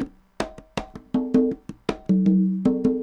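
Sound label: congas 80 bpm, latin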